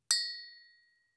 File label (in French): Cloche mandingue medium; clochesmandingues